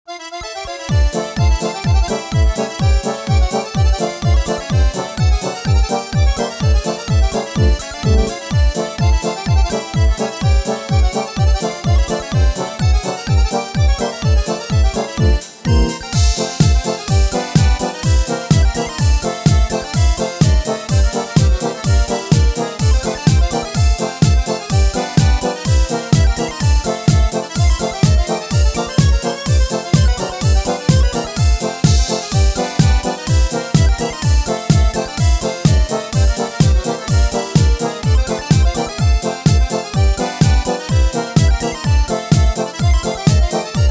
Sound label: Polka, Midi